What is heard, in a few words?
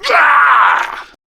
Death, Male; Warframe; Grineer Male B; Battle Barks